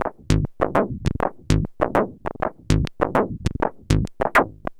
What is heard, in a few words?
perc 100, Percussive Loops, 100 bpm